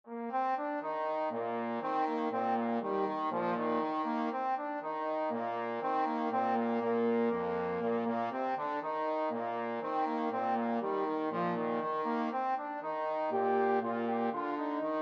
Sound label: trombone duet